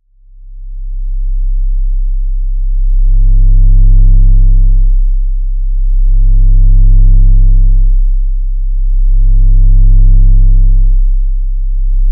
股間ヴァイブスピーカー 33hz; 前立腺に直接響く33Hz極低音刺激; 世界初の股間から聴く音声

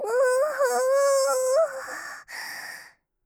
喘ぎ声; カスタマイズ用音源

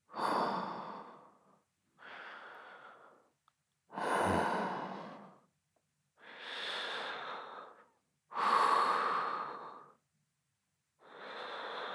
寝息ボイス; 和混血帅气男生的独占舔活契约~要高潮几次都可以哦~

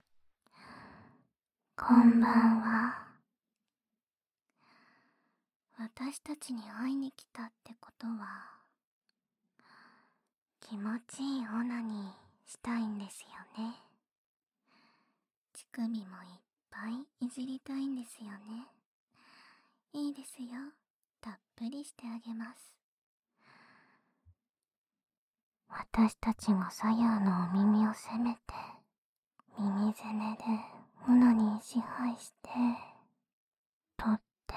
耳ふーと耳キスで乳首焦らし, ASMR

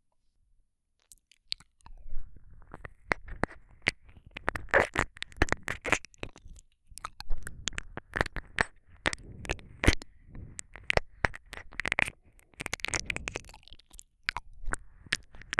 耳吸いお掃除耳舐め, ASMR; 【超耳舐め特化】ふわふわ気持ちよくなる耳舐め【安眠ASMR】

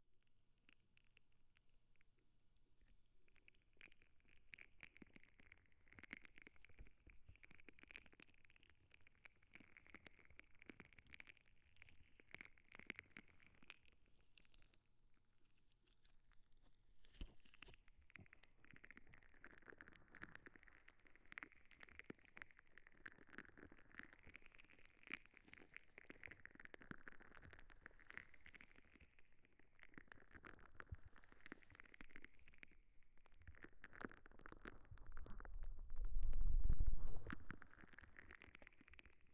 ジェルボール; ノア

